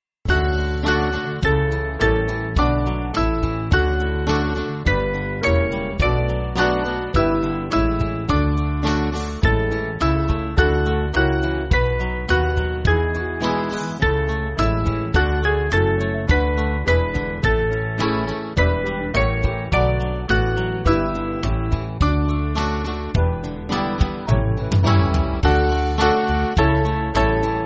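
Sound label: Band, Music Backing; Christ The Lord Is Risen; Wurtemberg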